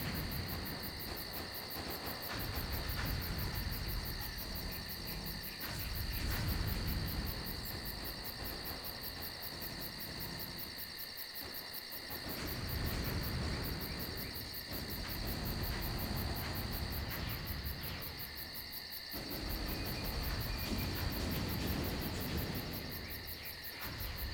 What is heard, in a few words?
battle in distance; WAR